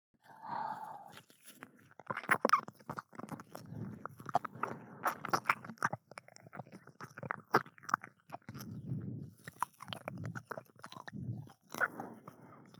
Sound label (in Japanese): 耳舐め→耳舐め座位, ASMR